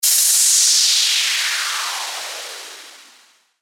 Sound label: WHOOSH